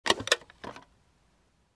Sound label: Clicky Button